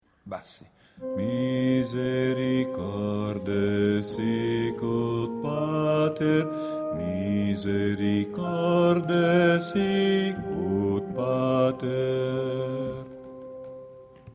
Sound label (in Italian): Bassi